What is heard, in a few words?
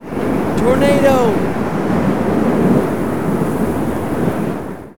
tornado